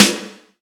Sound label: short reverb snare sample G sharp key, steel snare drum sample tuned to the G# note